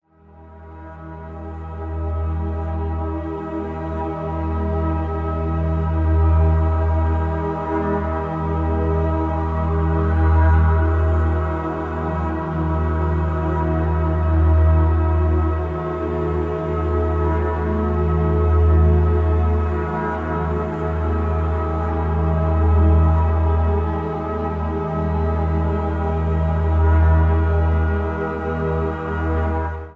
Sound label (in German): Hoerprobe Somnia Solfeggio